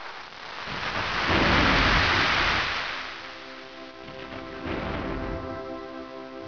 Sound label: Wind; 特酷声音素材库; 自然; 风声